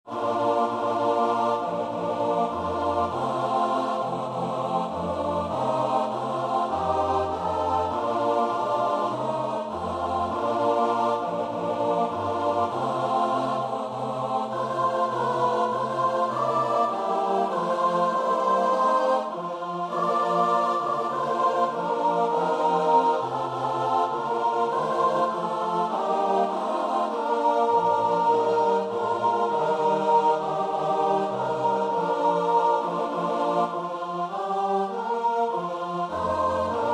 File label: CHOIR